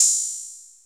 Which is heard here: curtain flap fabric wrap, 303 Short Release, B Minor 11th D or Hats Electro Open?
Hats Electro Open